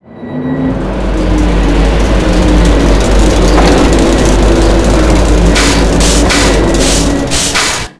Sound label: Fab Boss Alive; The Elder Scrolls III - Morrowind